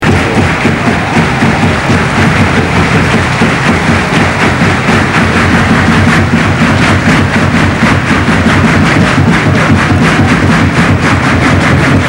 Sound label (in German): Klatschen